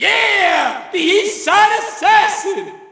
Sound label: Shout, audience; UFC Tapout 2